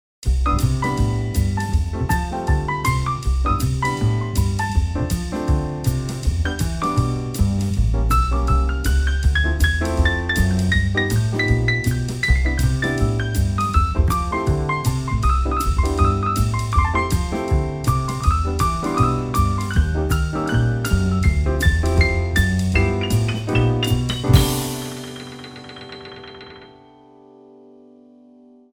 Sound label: Jazzer mix